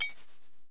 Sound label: ring drop